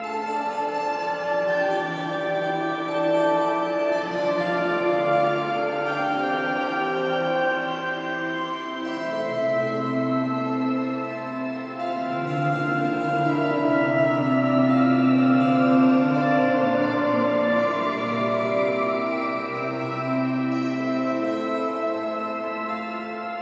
musicians charm layer

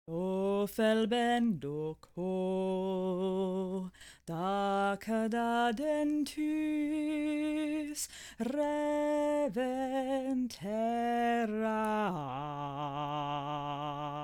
Phantom Sing; Hollow Knight: Silksong

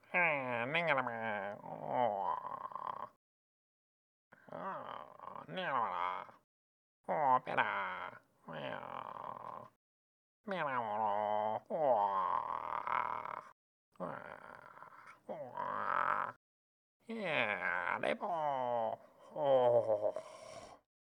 sleep single; gamerip

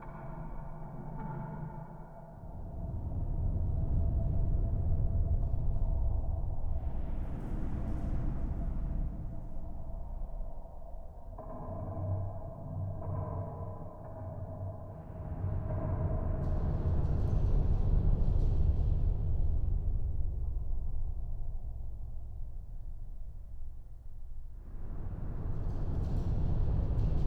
atmos deep docks interior no steam; Hollow Knight: Silksong

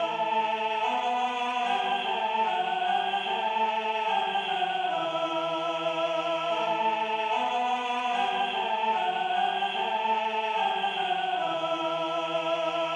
architect mel front row only loop; Hollow Knight: Silksong

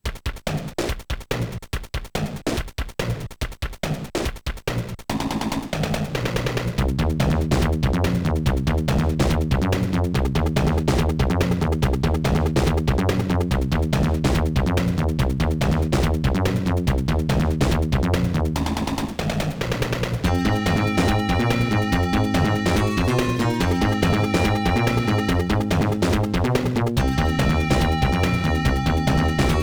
Stage 13; Dr. Robotnik's Mean Bean Machine; The 16bit Audiophile Project; Genesis

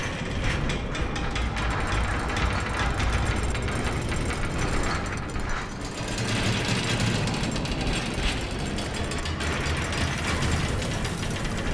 cogcore broken cog field

